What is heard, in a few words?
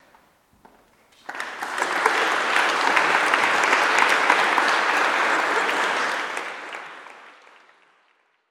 Applause; Debussy Recital June 2003